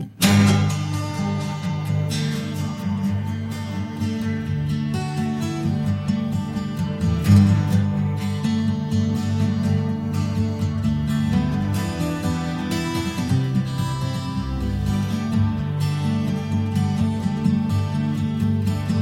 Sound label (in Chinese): 山林木屋壁炉，民谣木吉他旋律裹着羊毛毯温度; Mountain cabin fireplace, acoustic guitar folk tunes wrapped in wool blankets; DiffRhythm